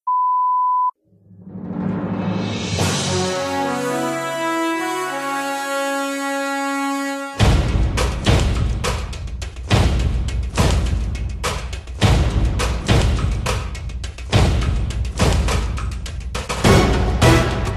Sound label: floor music; larisa iordache